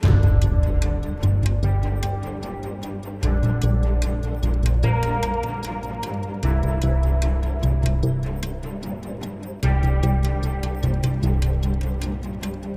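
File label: Music Percussion, Percussion Loading 75bpm; Music Perc 1#5 (Pm Perc Loading 1 75bpm Game Mix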